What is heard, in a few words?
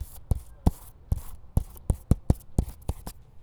Jostle Books; BB Retail Therapy Sample Pack